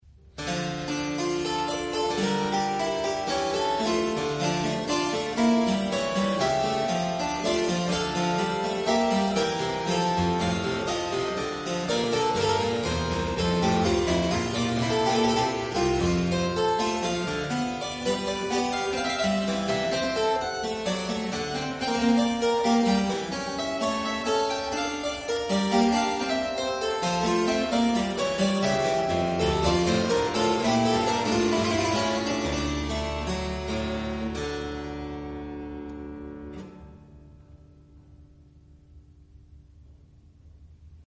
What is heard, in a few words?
kleine Praludien in E minor; BWV941; Johann Sebastian Bach